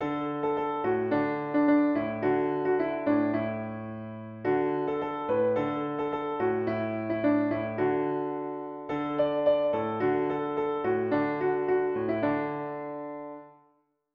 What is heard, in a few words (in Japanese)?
ピアノ